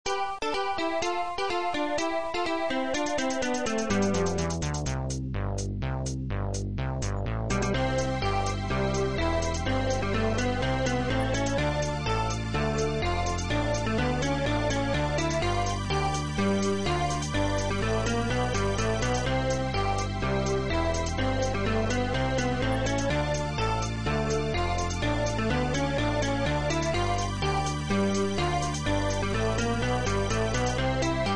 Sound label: music